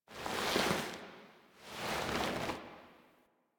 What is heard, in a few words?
Reliquary Foley; Warframe; Rail Jack